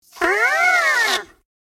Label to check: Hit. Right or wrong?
Wrong